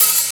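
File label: open hat; Funky Ass Loops; East West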